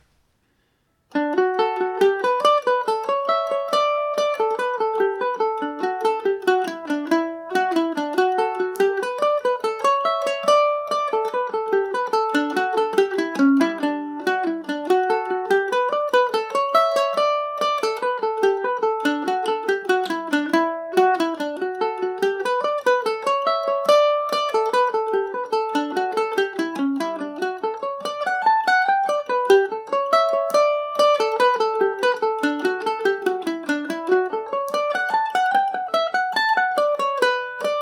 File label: reel dmaj, mandolin; The Look-See Reel; G&O #34 mandolin